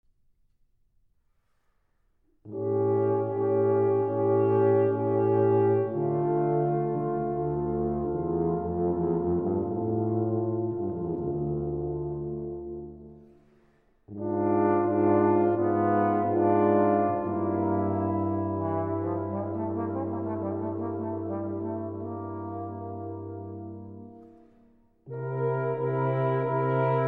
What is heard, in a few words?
Concerto for Two Trumpets in C - Largo